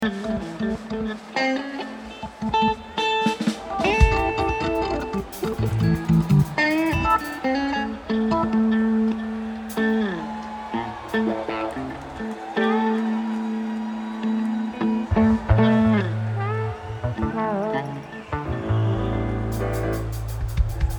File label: tuning